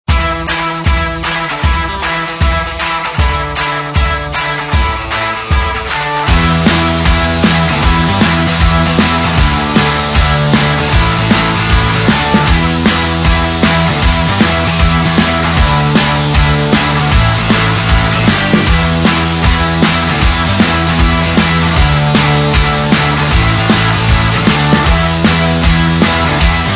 Energetic Upbeat Rock

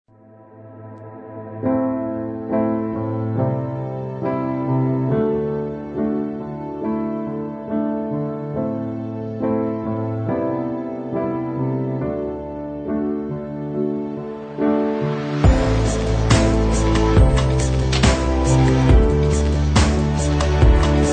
Inspiration

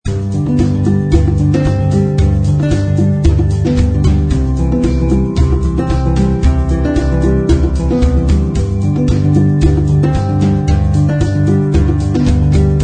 Verse NoPiano; 夏日温馨套装 Warm Summer Kit

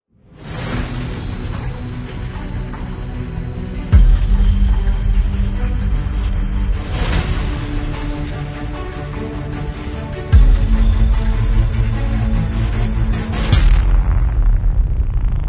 Logo Hybrid

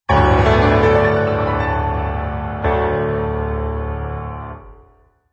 Piano Logo Reveal Long release no violins

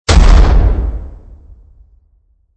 Explosion Trail Off

